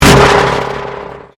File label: Convolution Pulse